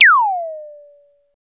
fx-percussion, Techno; Techno ID; single-drums

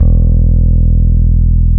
BASS, World of Synthesizers; Soli-Music; World of Synthesizers VOL-1

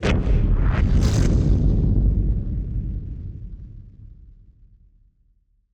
Hit Splosion; musicradar; bomb factory

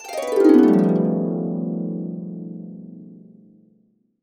A, Harps; USB Soundscan vol.62 - Complete Harps; AKAI

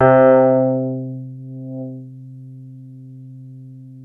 PNO THICK; MORPHEUS

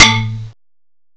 BIGDINK, MALLETS; M8; CMI Factory

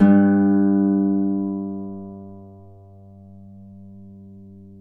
Celtic Harp

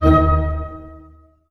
STAC D# PP, ORCH STAC; Sound On Sound - Peter Siedleczek's Orchestra

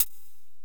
Korg x5, Drums

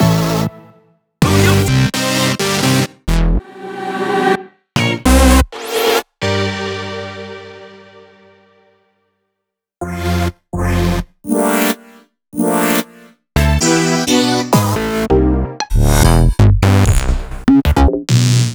Synth Shots

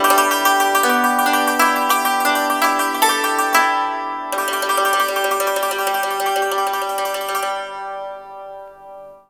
YANG CHING, Heart of Asia; Spectrasonics

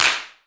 CLAP, HAND CLAP; AKAI S6000 CD-ROM - Volume 5

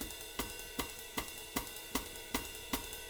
310 BEBOP, Living Drums; Sampleheads; Peter Erskine

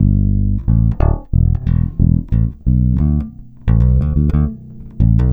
Electric Bass

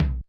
Tom, mxr; classic machines

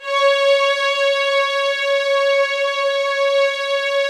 C#5, LOUD VIOLINS; Q Up Arts; Denny Jaeger Master Violin Library

